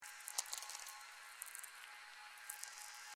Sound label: Meteor VLF